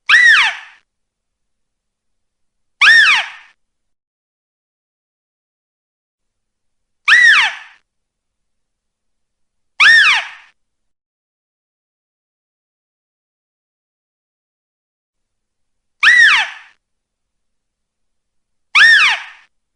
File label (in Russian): manok na kosulyu